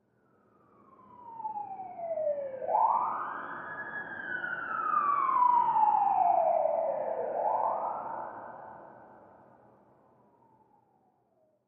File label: Siren Slow Police By